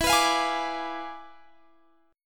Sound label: E 7th Flat 9th, chord; E7b9; strummed; x,19,21,22,21,22